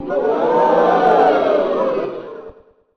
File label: crowd ooh